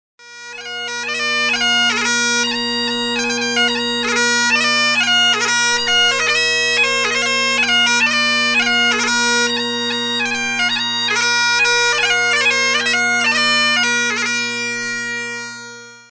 Highland Wedding, bagpipes

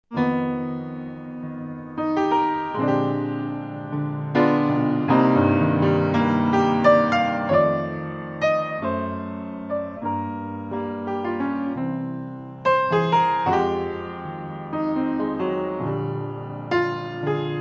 Trio, Piano

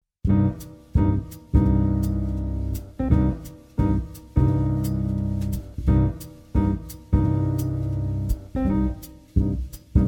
Piano

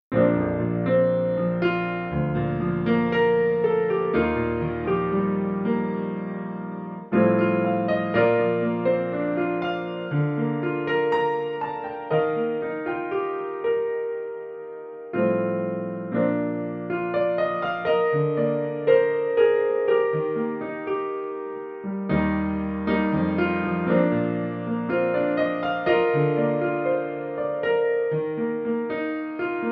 solo piano

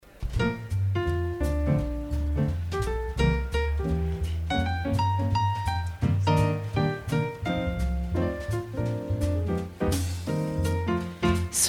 Combo with female vocalist, swing band

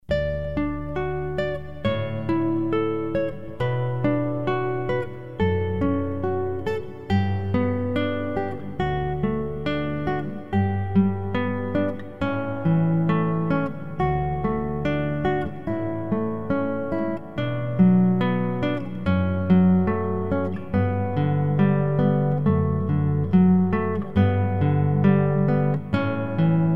Guitar; Cannon in D

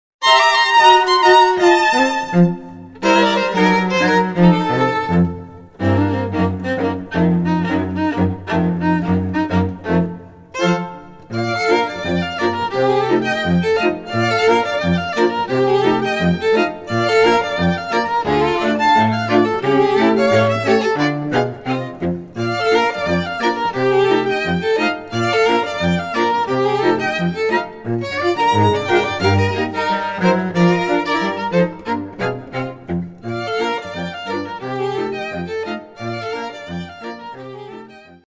Rag, String Quartet; Agitation Rag; Dolce Vita Strings

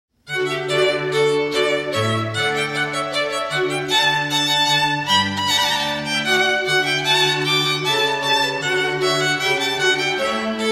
Rondeau, string quartet

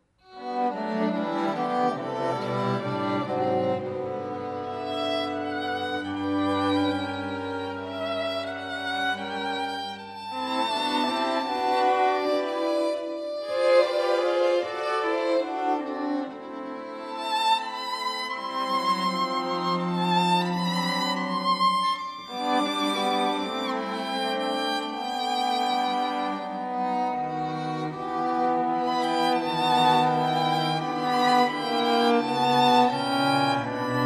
String Quartet; Classical

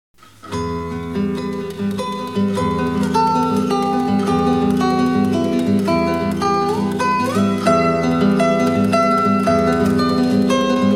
famous classical guitar music

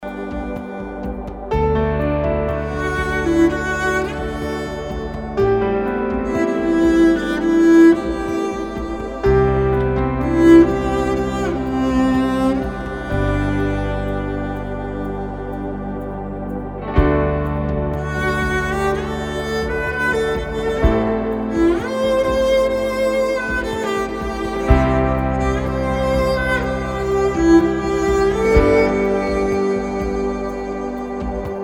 cello

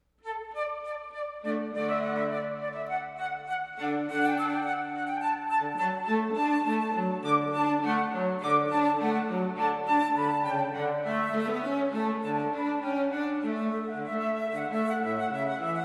Chamber Music

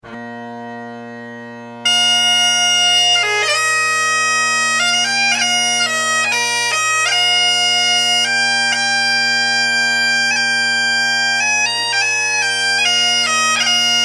Bagpipes

